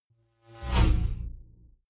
sci-fi vehicle drive by buzz fast; raumschiff

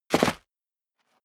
step sneaker concrete grit jump; schritte

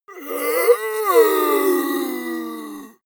monster growl hoarse; comedy-cartoon; soundpool

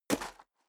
step high heels gravel medium single left; schritte; Footsteps

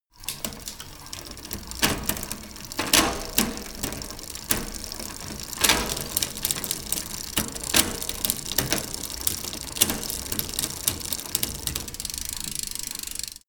bicycle spinning wheel

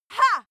female cheer hah; schreie; menschen

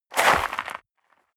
step leather shoes gravel light jump; schritte

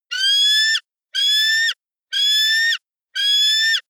eagle african fish call; natur tiere; voegel

